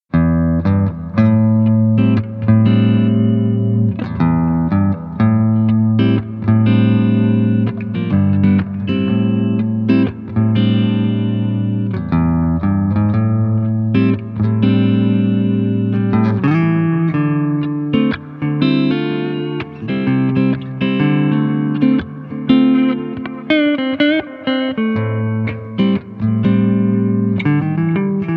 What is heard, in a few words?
Neck Clean